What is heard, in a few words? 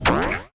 balloon bounce; coffee crisis arcade edition